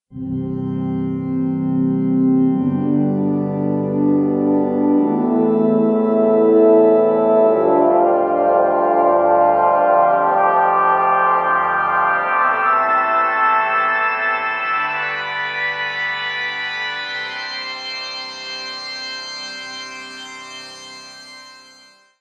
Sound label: c major scale